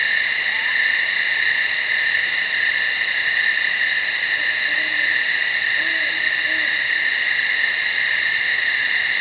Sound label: OWLS AND NIGHTINGALES